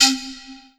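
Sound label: Yamaha TG500 Breath C4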